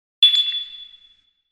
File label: UI comform sound